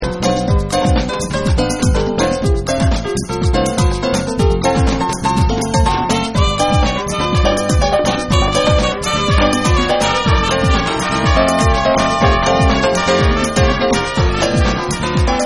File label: Trumpet Dub